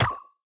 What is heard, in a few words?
Shield Shot; MiSide